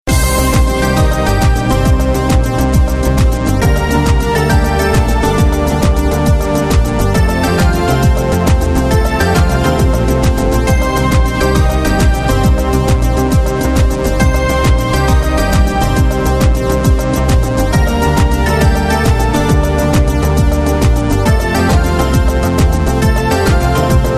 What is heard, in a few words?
The Dark Music